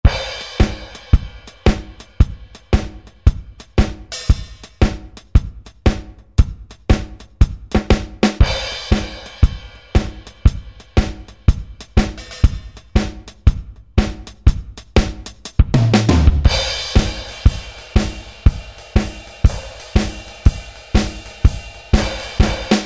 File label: Country Drum, Roland SR-JV80-17 Country Collection